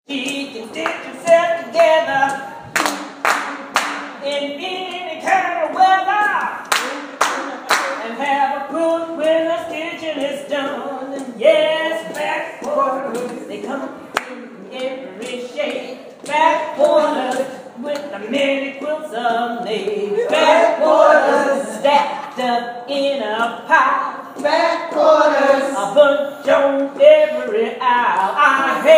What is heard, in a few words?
Quilting Song